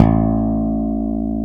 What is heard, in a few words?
E.BASS